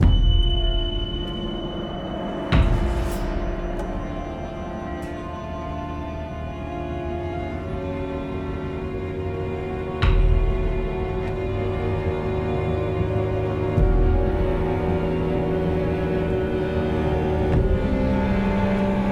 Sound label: Linear Tense; the walking dead michonne